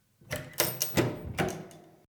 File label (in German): Tuer Haken oeffnen